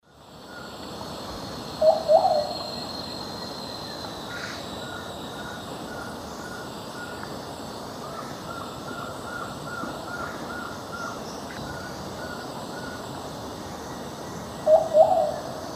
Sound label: Patagioenas plumbea, song; S. America; Eastern Lowlands